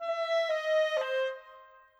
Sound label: Clarinets, Orchestral; Sonic Foundry (Sony Creative Software); Orchestral VOL-2; Modern 11